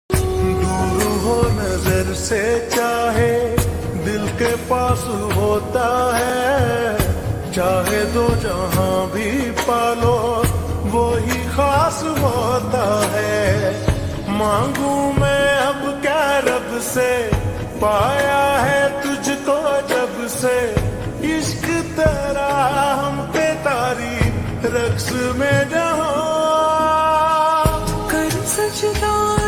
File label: Slow Reverb Version Ringtone